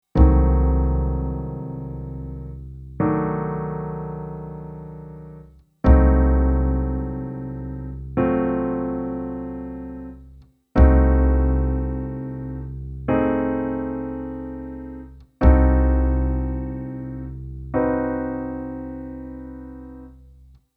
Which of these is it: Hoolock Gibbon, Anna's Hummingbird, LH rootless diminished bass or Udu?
LH rootless diminished bass